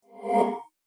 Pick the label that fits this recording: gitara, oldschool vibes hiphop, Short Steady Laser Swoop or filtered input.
Short Steady Laser Swoop